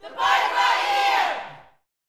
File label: "party", gospel shouts; Spectrasonics; Vocal Planet Vol.1 Gospel; akai; UYC SHOUTS